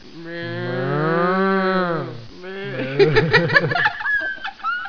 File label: moo, gals; cow; hall of moos